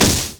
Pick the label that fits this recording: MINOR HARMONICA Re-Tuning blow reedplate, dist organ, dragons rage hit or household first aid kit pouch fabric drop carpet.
dragons rage hit